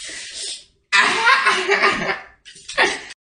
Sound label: ahahehhh